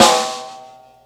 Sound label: Ring Snare, Snare One-Shots; Drum n Bass; KJ Sawka; Drum n Bass & Beyond; Drum Tool Box